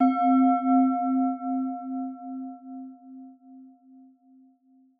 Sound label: tail, sound bowl